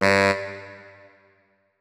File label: notes, saxophone; Hideout Furniture